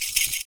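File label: PERC, percussions; Univers Sons - Raricussions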